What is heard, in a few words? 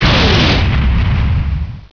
jumpjets; Tanks